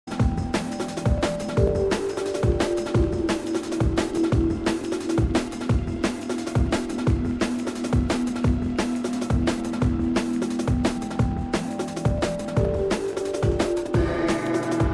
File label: Drum and Bass Jungle and Old Skool, UK Bass Radio